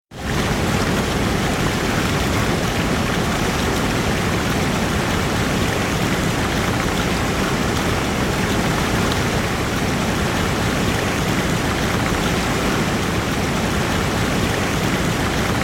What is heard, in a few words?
Waterfall Sound Effect; cascades islande; les plus belles cascades islande